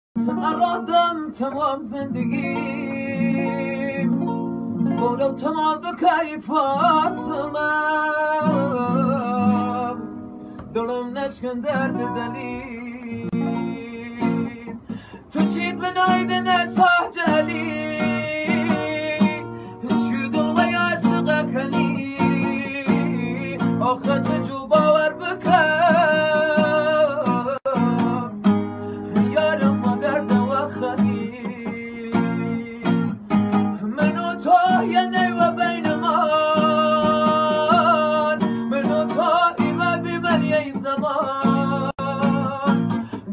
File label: kordi ba gitar; kordi ghmgin